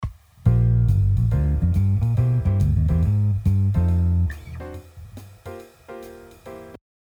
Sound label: F blues 251